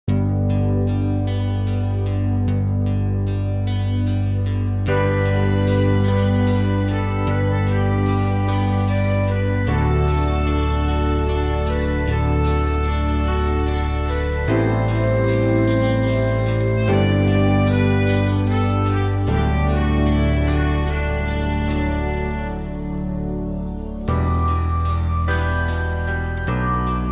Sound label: G No Backing Vocals, backing tracks